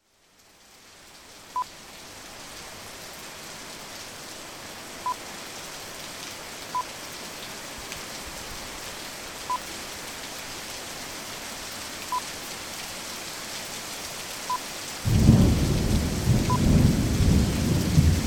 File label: Heavy Rain And Thunder